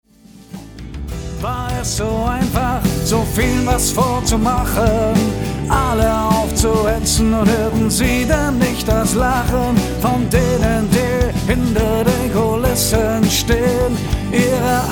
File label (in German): Sie klatschen im Takt